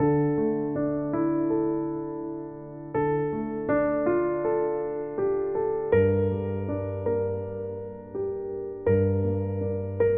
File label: Relaxing Jazz